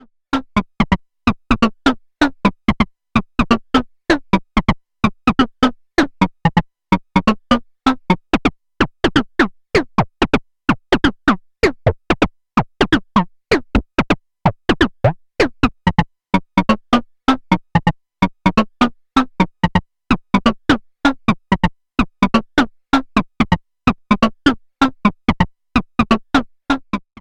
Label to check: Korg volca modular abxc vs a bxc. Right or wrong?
Right